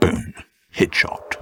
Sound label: Boom; Headshot